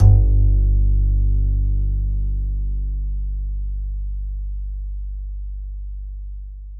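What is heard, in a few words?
acoustic bass soft G 1, left; Masterbits CLIMAX 7 - Bass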